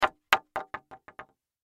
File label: Impact Wood Thin Pole Hit Cement Bounce; Sound Effects:Materials; Blastwave